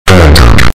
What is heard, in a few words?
loud panther